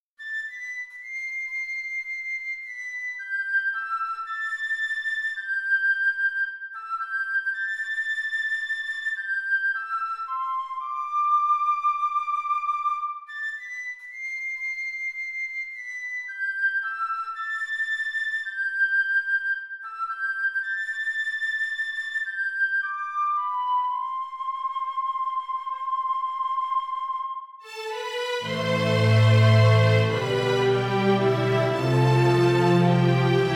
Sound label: Celtic Lament